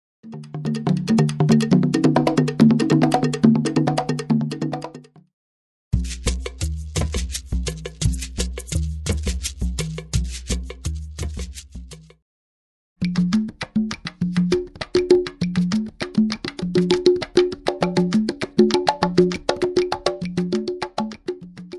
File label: PERC